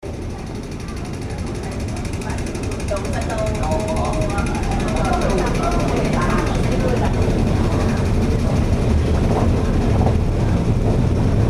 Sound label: MTR Tiu King Leng elevator to platform